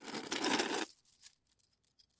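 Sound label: sounds of chains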